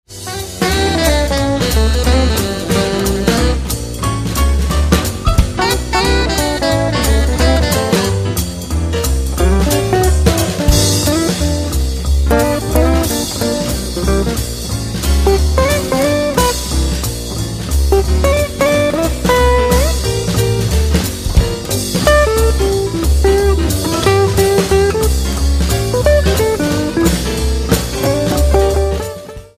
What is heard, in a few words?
Dinner Jazz